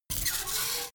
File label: fart horn